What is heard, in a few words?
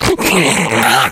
snarl, infected